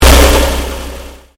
Convolution Pulse

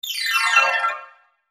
Logo Shimmer Descend; Sonic Logo; Blastwave

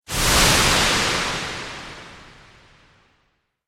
Modulation Whoosh; Whoosh Sound Effects

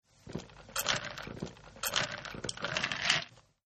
Drinking; Human; Partners In Rhyme

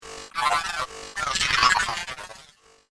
Droid Busted Up, robot sound effects; science fiction sound effect; Andrew Fleming

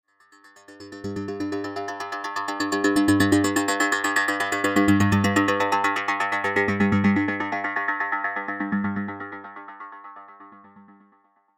Dark Future Pan Synth Pulse; Scary Soundscape; science fiction sound effect; Andrew Fleming

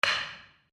Wood On Wood; Material components for constructing more complex sounds; Wood (Sound Effects:Materials); SoundEffects-Foundation4

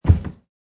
Trash Can Thud, Knocking a trash can; Cleaning; Household

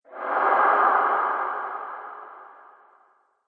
Gruesome Ghost Monster Discharge; Sinister, Scary, Spooky; Andrew Fleming

